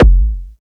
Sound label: combidrums, techno; single-drums